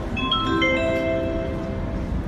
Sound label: audio system test; hanover displays